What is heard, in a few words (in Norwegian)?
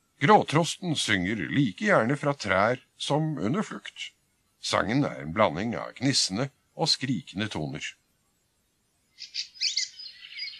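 gråtrost, fuglelyder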